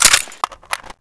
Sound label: mag out, weapons ak